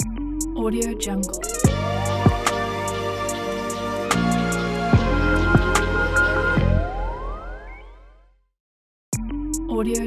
ambient lofi hiphop logo

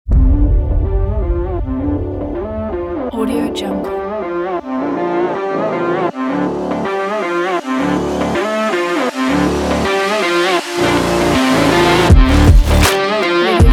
fashion rock

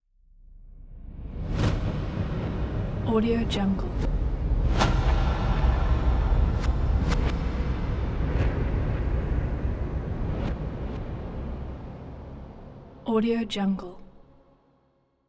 tension hall breaths; soundmorph

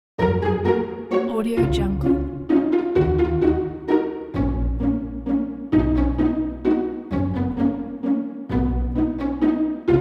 my cartoon

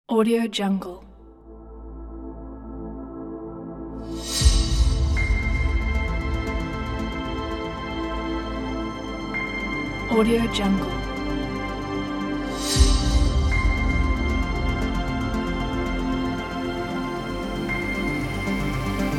epic

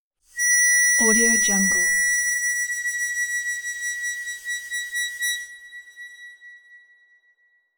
high harmonica tone

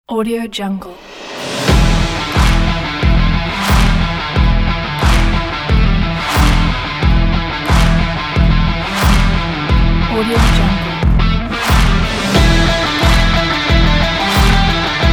brutal stylish sport rock

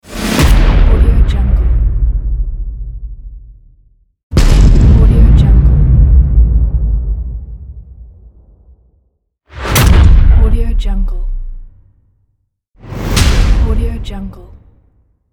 trailer impact hits

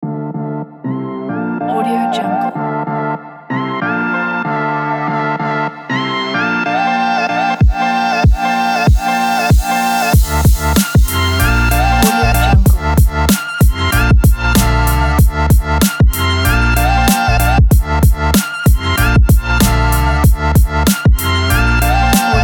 chill hip hop background

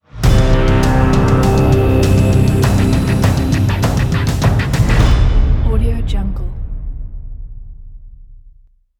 drums epic logo

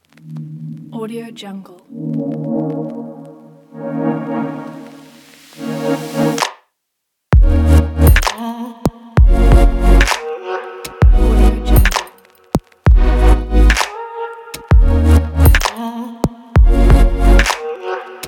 abstract beat vlog; qubesounds

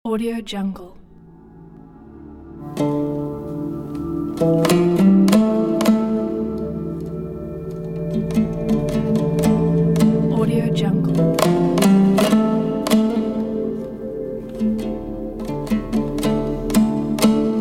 kazakh dombyra

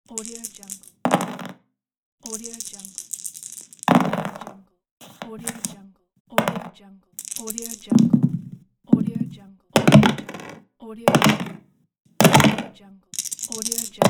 dice rolls

